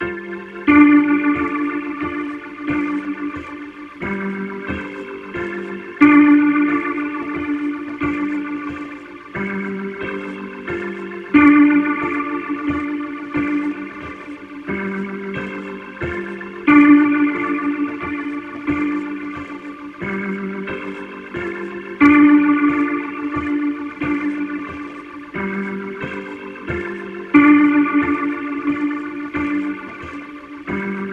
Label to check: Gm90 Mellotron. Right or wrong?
Right